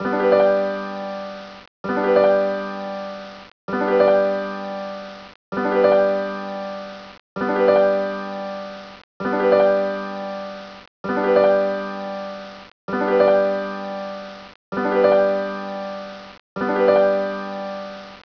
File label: piano Loop